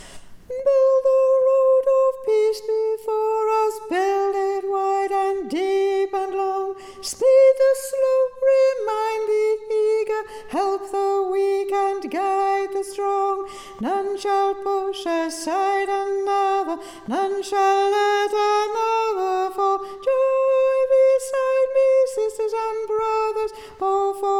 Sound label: Tenor